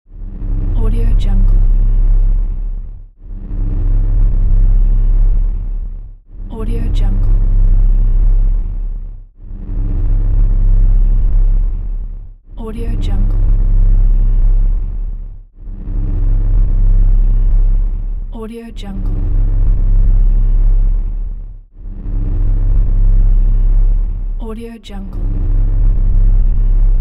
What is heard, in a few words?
electro pulse drone